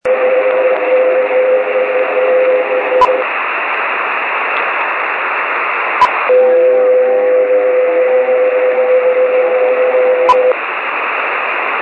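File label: background noise; 20m band; Icom IC-706MkII(G)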